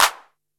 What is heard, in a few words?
KOMMERZDRUMS; Soundengine - CSR-000 The Art of Transwaves